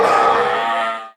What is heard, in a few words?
trooper pain